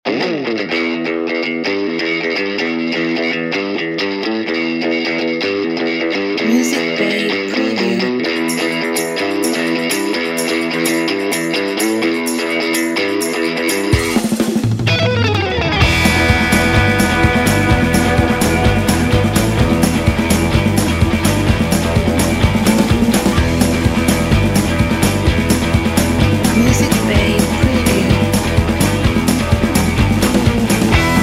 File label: Surf Rock